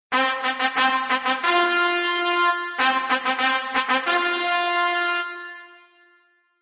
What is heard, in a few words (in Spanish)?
trompeta